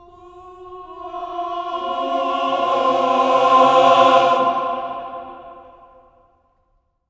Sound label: CLUSTER, Voices